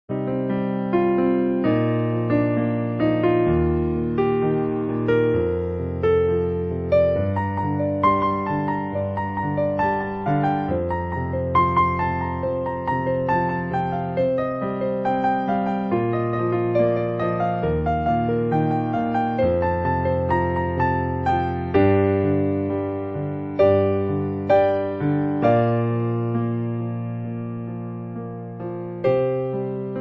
Piano Live; The Human Race (Goldrunner)

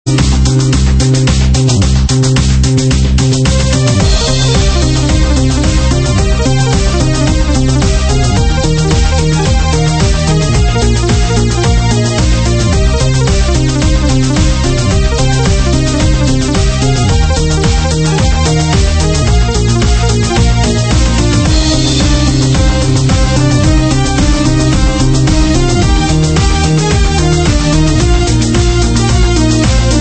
industrial mix; Bombo

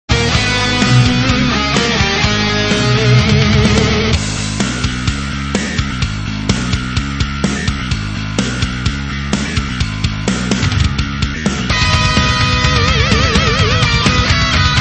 RockMetal Cover; Ghosts 'n Goblins

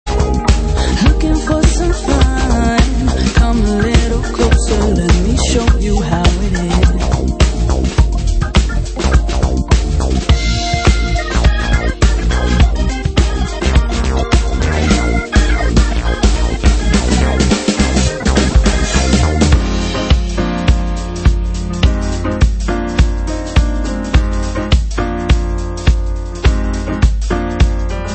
Funk (embellished)

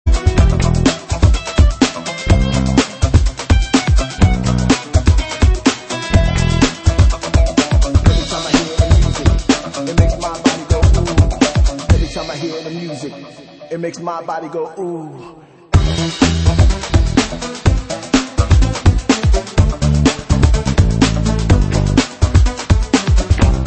Drums

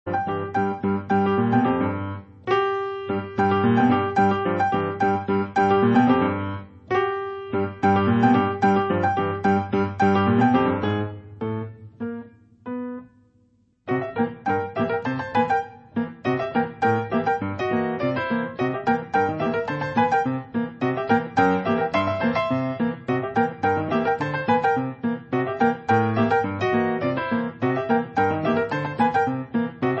Piano; Thing on a Spring

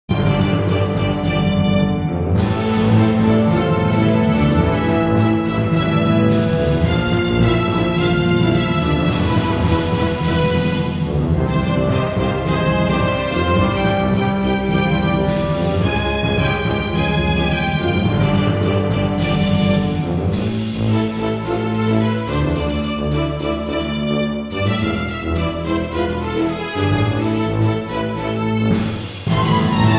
Orchestral; Commando

